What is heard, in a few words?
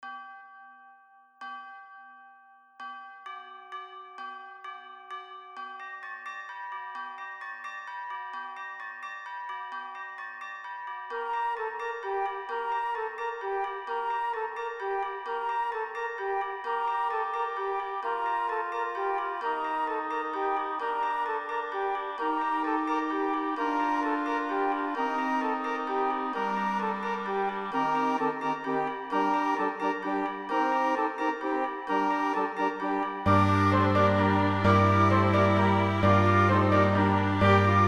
carol bells